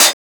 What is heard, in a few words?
HAT DIST, Drums; Distored Reality 1&2